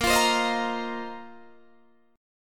A sharp Suspended 2nd Suspended 4th down Guitar DADGAD